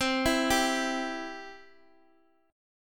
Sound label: C Major, Guitar Open D